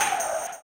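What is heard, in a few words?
tambourine, 160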